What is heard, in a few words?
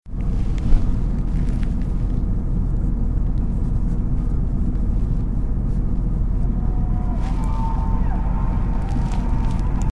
crackling cheer; nov-dec 2007, ten seconds. Distant cheer in background at end.; Scrabbling